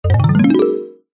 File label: cartoon ascend climb fast mallet; zapsplat